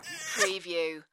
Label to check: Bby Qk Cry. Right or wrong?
Right